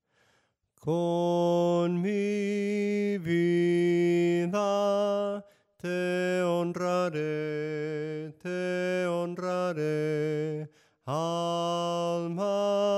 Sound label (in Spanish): SOPRANO CANTADO, himnos en melodía soprano; cantos del camino